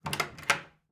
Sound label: door open